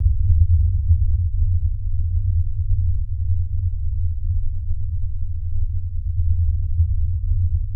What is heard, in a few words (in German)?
MASCHI; Best Service; Advanced Mega Effects